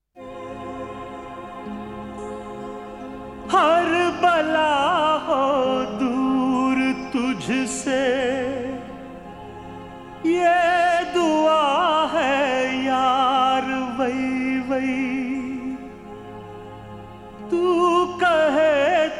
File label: Sad Version